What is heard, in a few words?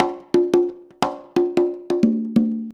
CONGA, Modern Percussion Loops; USB Soundscan vol.56 - Modern Percussion Loops; AKAI